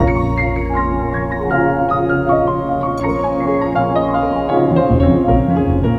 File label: Melody Loop BPM 160 C; Gully Loops